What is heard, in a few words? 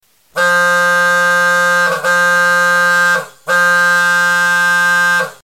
Air Horn